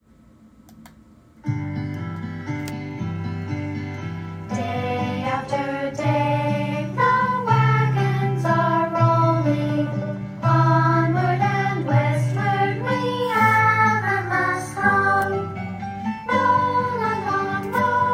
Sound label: Covered Wagons Vocal